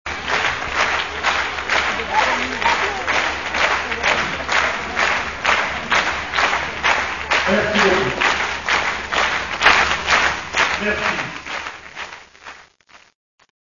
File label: ambiance applause